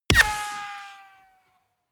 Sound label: bullet large impact ricochet